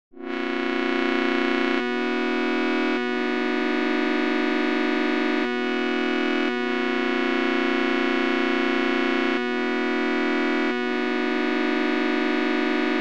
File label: chords